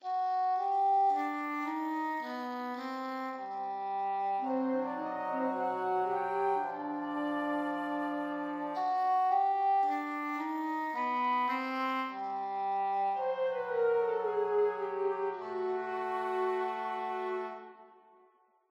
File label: String Melody; Sarco; tintin reporter cigars of the pharaoh